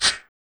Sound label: RSS PERC